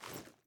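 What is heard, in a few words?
charge reload fast arm, pistol; gmod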